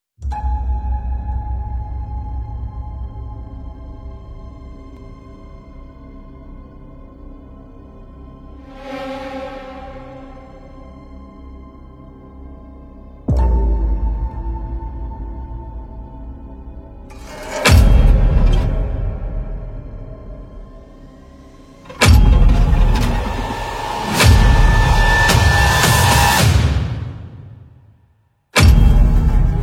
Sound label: Horror Music